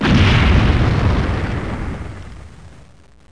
explod; thexder

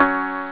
boost; drums

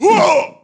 shar male attack; DAoC; Toa; Gamestar

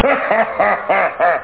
h Laug

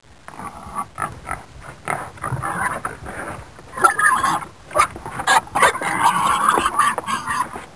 Pigs Feeding

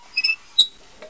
Chair; Dino Crisis; room

